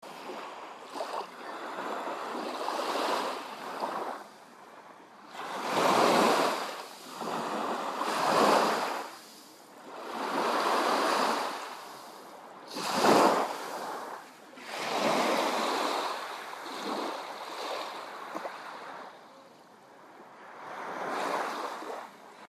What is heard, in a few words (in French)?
mer